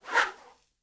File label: Whoosh, Arrow, Feather, Low; Universal SFX